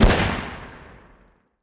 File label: firework Explosion; shangrila